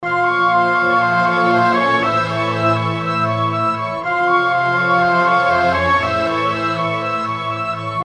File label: dry mix, orch